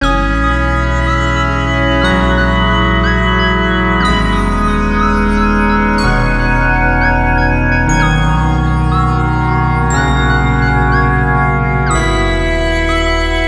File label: pad loop shorn phrygian, 135, Eb